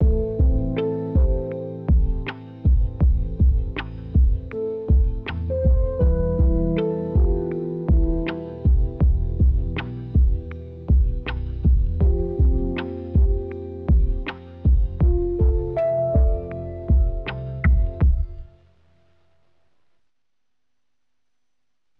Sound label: anxious pulse